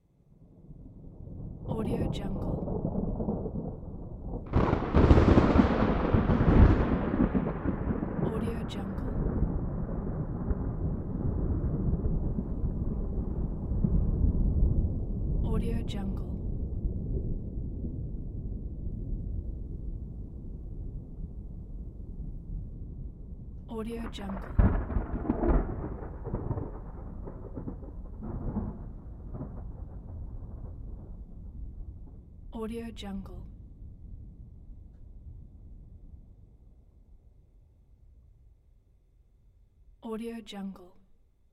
thunder

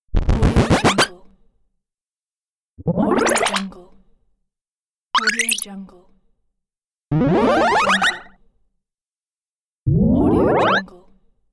energy boost

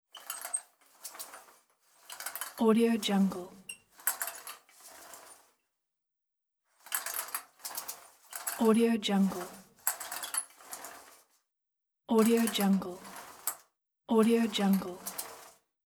paint roller in bathroom

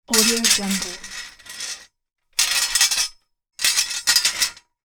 metal bar movement hits

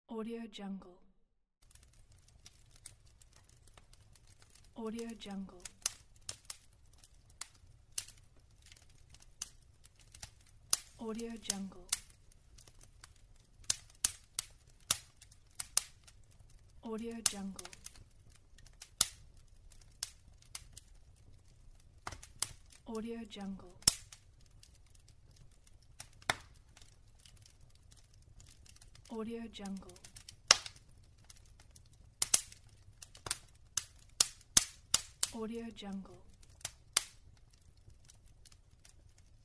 fireplace; erhanaarassfx

